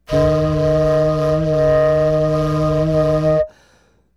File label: FL HUM D#5, FLUTE HUM; Greytsounds - Philip Wolfe David Crozier's Saxophones